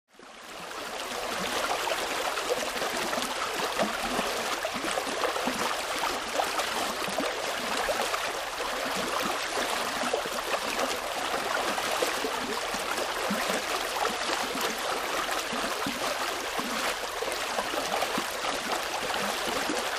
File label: Water Flowing Between, rivers